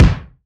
BD, BASS DRUMS; EdgeSounds

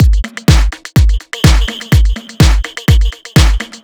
Little Screech, Drum Loops, 125 BPM; Sonic Foundry (Sony Creative Software); Underground UK House & Electro

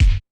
Korg 01W Dance Kit; 300 Drum Machines

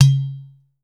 udu; Voice Crystal - Steve Reid Definitive Percussion

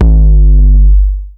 DUTCH KICK; Masterbits; Soniq Elements

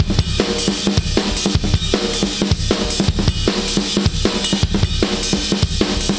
Beat Loops, 155; Book em; Zero-G - Monster Beats

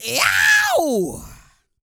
YELL, MALE HUMAN; Spectrasonics Vocal Planet

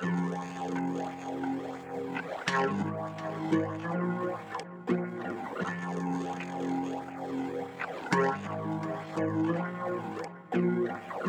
GTFX, Hip-Hop & R&B; USB Soundscan vol.47; AKAI

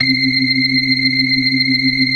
BLOOD OCT R, ORGAN

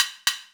SN, drum machines

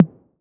808 TOMS; Wizoo - Platinum 24 Electronic

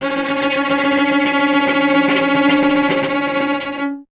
STR cello C#, Cello; Miroslav Vitous; Solo Instruments VOL-1

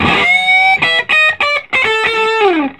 Vintage Blues Guitar, SOLO

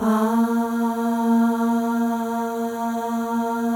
AHS, FEM; Spectrasonics; FEM.1 AHS

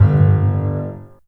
THUD, The Piano; InVision Interactive

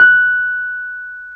PNO, Wurly

